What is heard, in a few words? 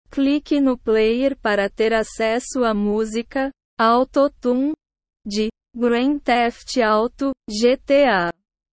autotune